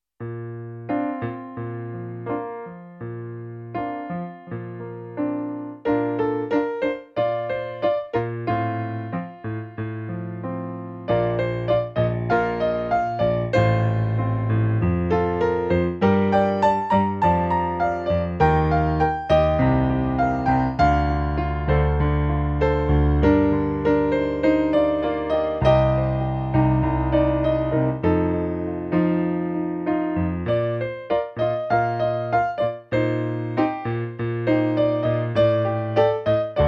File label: Piano